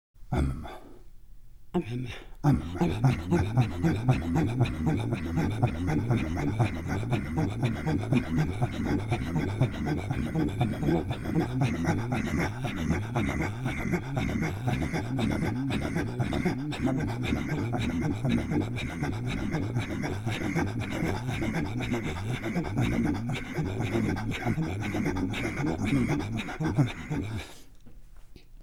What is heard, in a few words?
Throat Singing, INUIT; Amama